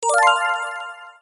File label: Bubble